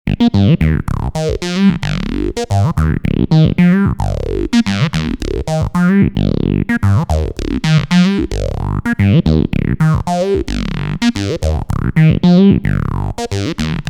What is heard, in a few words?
synth funk wet